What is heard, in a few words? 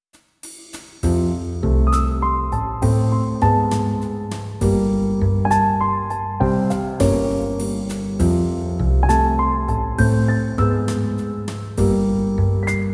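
smooth jazz, BACKGROUND MUSIC FOR VIDEOS; smooth jazz demo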